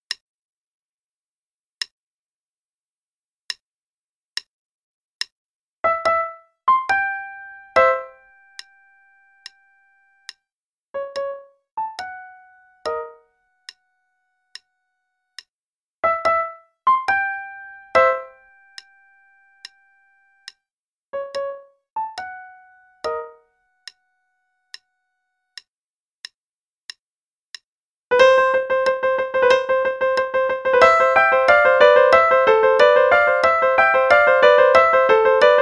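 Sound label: Play-a-long, quarter note=72, 80; Razorenov; Two Roosters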